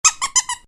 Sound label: Squeak, Squeezy Dog Toy; Miscellaneous Toy Sound Effects; Miscellaneous Toy (Sound Effects:Toys); Squeaky toy; Stuart Duffield